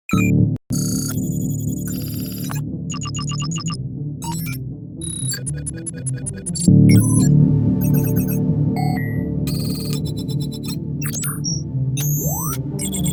binary code interface sound effects sci fi computer beeps data processing